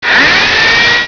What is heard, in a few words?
alien platform stopped